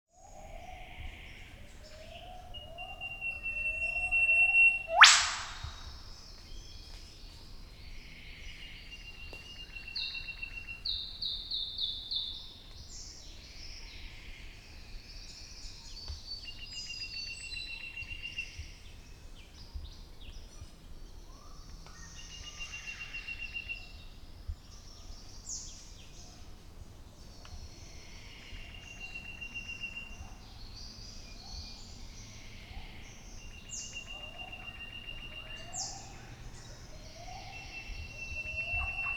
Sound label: Psophodes Olivaceus; Eastern Whipbird; Psophodidae (Whipbirds and Wedgebills); Call 2